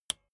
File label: bicycle street brake release